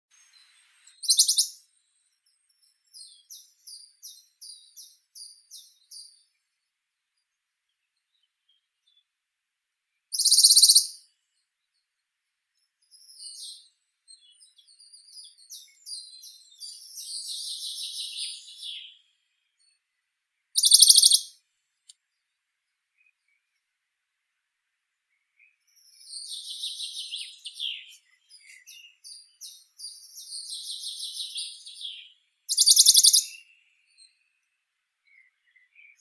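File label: Bonelli's warbler, Phylloscopus bonelli; penochka belobruhaya; zvuki prirodi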